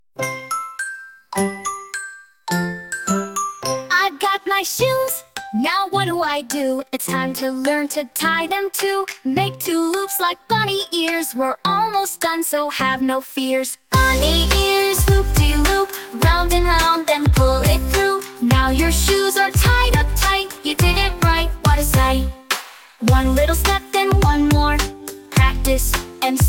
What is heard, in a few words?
Bunny Ears Loop de Loop; Mastered with Aurora at 50pct; brush your teeth